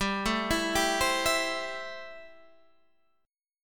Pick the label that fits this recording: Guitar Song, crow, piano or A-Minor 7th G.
A-Minor 7th G